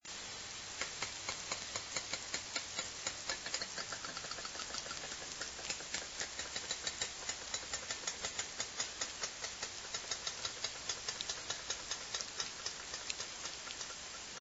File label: j clicks med, orca